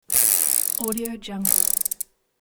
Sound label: sprocket spin clicks